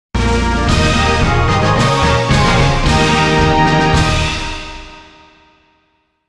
Promotion; Virtual On Force; Marsinal

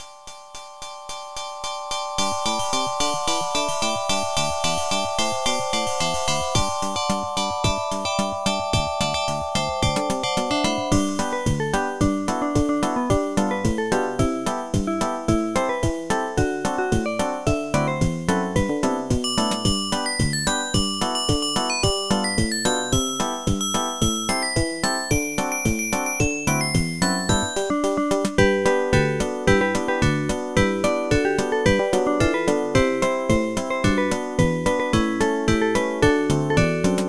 Jingle Bells, music synthesizer card; AdLib; IBM PC XT AT

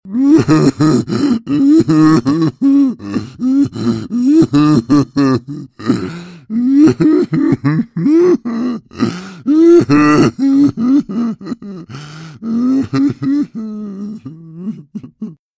King Pig Cry; Angry Birds

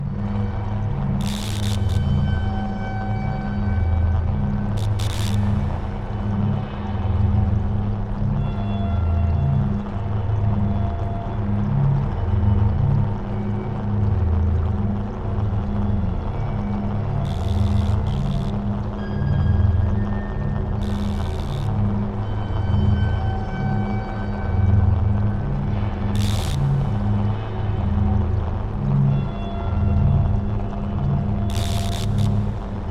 Lab Ambient; Nancy Drew The Creature of Kapu Cave

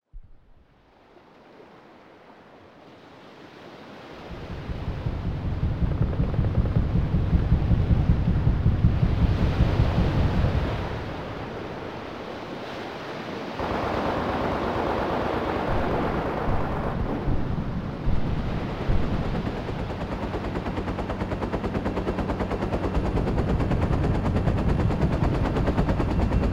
war ambience; Worms 3D

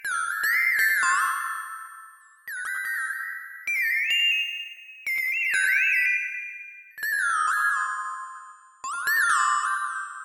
Frog C Raintune; Proteus

